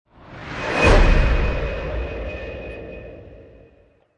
Hit Swo Reverse Steel; Brand X Music; Brand X Music Catalogue - Editor's Essentials

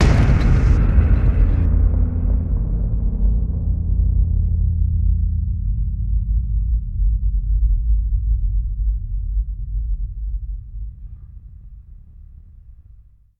Hit

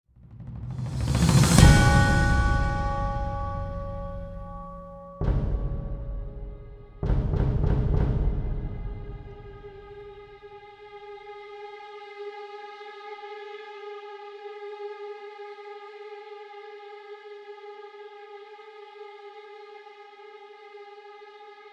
Drums Only Version

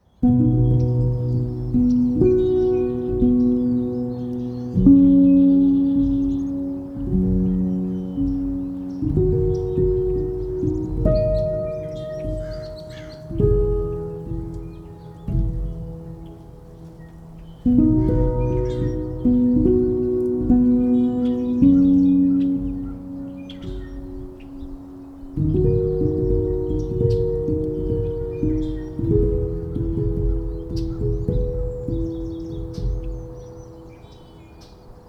Instrumental

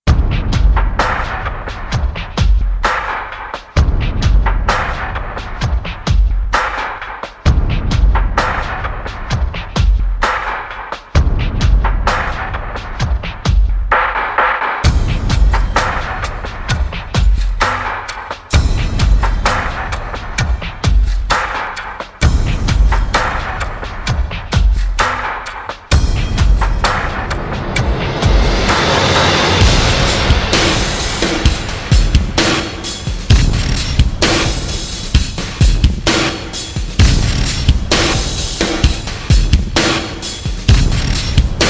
Drums Only Version

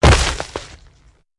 Hit Squish; Brand X Music; Brand X Music Catalogue - Editor's Essentials

